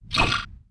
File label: ghost armor attack; propack; magic